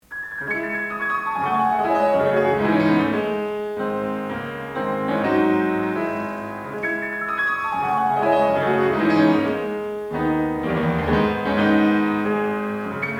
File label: Prelude No. 10 in C Minor Allegro molto